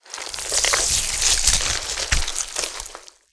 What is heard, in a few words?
worm strafe, giant worm